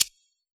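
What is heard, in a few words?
hi-hat FX, Yamaha DJX; 155 DJX Kit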